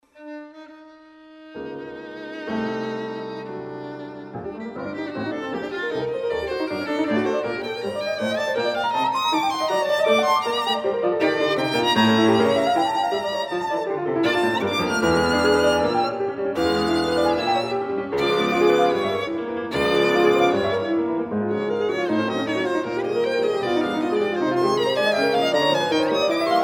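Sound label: violin sonata scherzo section; raff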